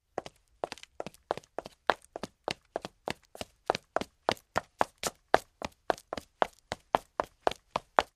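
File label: Footsteps Female Run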